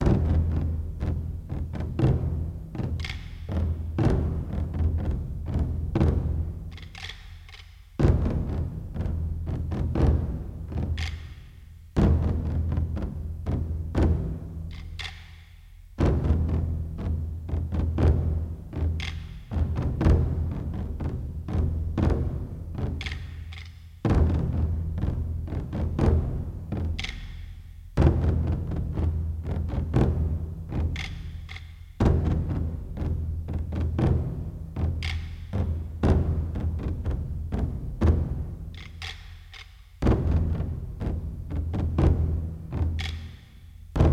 Eternal Drums; Age of Conan Rise of the Godslayer; Last Legion

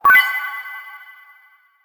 Ui Success

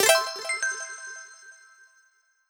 Stinger Learned Skill; Sea of Stars Original Soundtrack

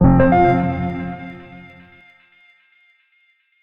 finished fizz; Radio Viscera

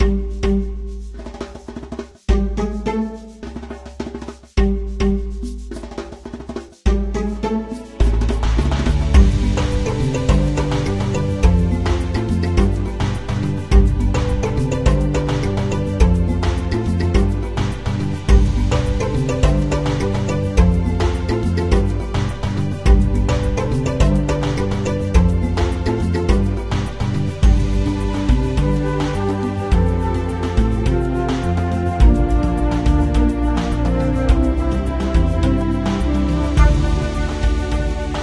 Sting; dino tales

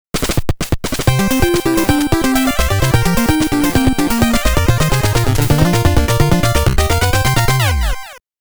VICTORY 勝利BGM; コズミックウォーズ; Konami Antiques Family Computer

